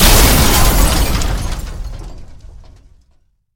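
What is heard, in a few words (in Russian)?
настоящий сокрушительный разгром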